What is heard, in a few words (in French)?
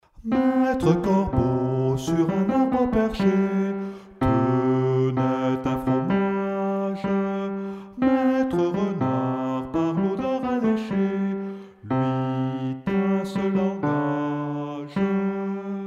basse